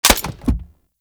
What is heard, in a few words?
Shotgun Mech; Warframe; Tenno; Alchemist